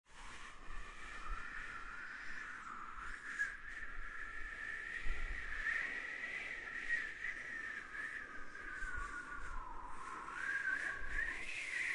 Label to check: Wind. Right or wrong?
Right